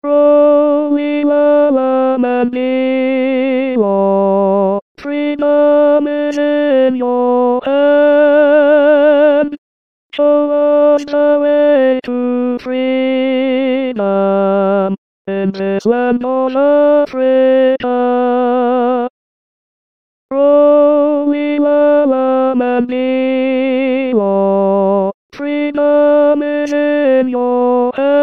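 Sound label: Freedom tenor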